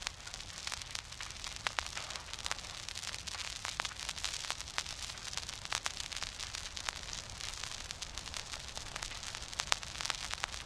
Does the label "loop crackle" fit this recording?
Yes